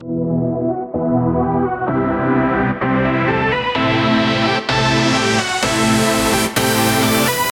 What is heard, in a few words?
Saw, supersaw, chord progression, top melody, E minor, rising low-pass, 4 bars, 128BPM; Audialab EDM Elements